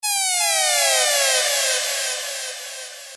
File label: blaster test